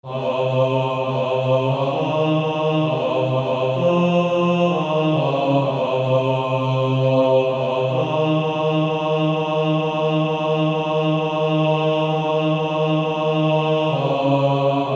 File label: Male Choir; Follow On